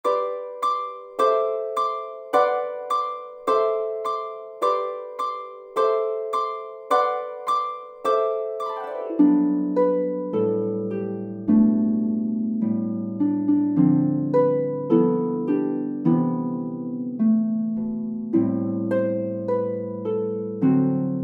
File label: pedal midi; Jingle Bells